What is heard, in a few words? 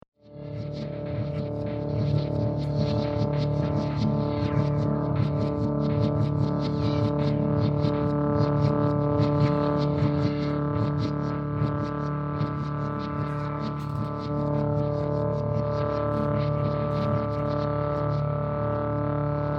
silenced noise